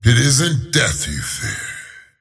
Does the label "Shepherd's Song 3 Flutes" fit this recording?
No